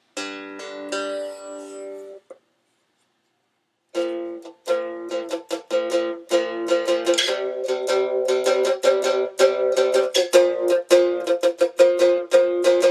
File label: guitar